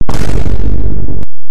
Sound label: boom distorted; vine